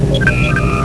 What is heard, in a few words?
blip; startrek